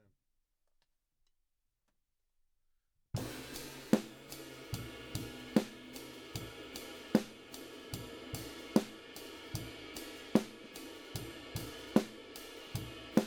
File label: Drums